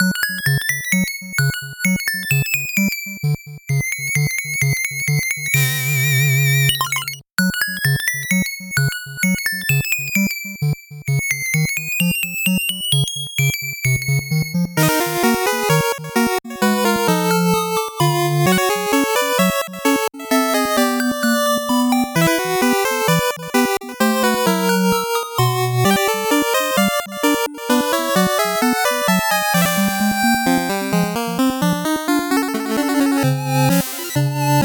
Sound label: 8bit bgm fast